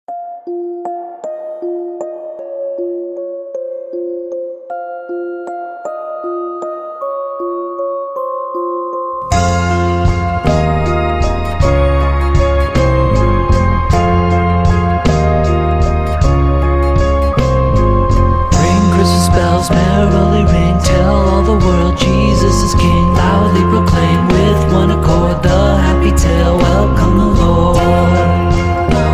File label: ring Christmas bells